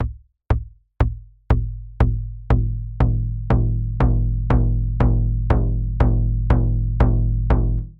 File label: BD Tonne